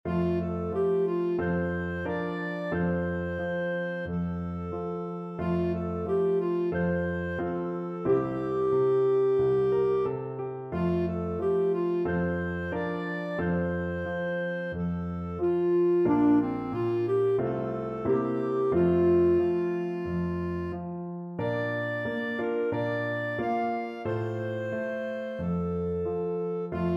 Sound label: cradle, clarinet; skinner